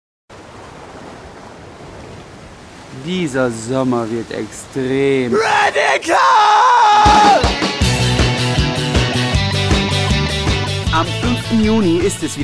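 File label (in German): Jingle RAP-Bühne Sommerfestival; Sommerfestival 2008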